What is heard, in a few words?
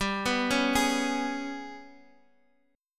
Ab Minor Major 7th Double Flat 5th